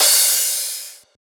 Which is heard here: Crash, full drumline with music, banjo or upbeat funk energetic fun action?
Crash